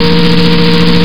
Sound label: xsara engine; valve